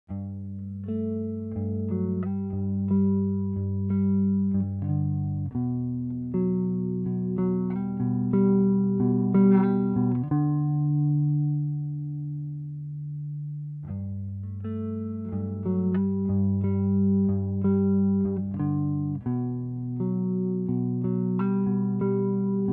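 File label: DI Line mono Bass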